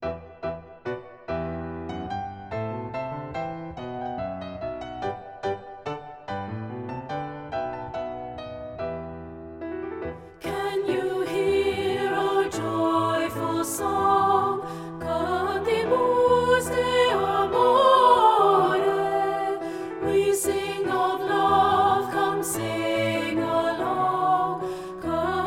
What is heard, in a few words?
SAB (Alto Dominant)